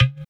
EQ Perc, Percussion Mix

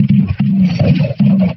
Jungle Bumpers; Sonic Foundry (Sony Creative Software); Esoterik Beatz; Jungle Fx

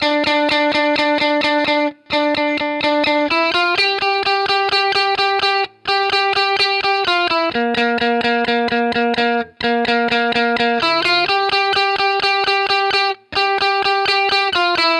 lead Dmin Bpm128, 70s Guitars; Fox Samples; Back to the 70s Guitars

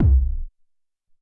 Ele Kik, Alesis SR-18

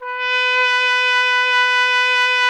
BRS TRUMPETS, Roland LA; Roland - LA Composer vol. 1

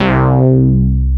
C4, HYBRIDS; East West - Global Sounds

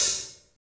HH HH, HH Real

